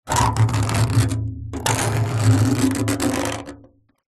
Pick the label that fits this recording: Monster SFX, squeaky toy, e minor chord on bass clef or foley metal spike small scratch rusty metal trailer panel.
foley metal spike small scratch rusty metal trailer panel